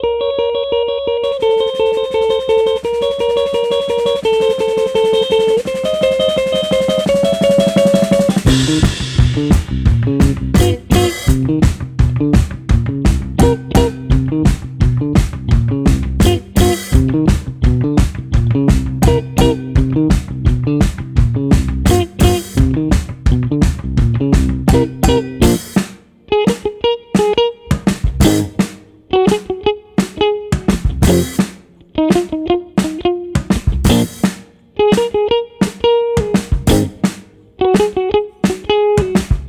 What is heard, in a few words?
drums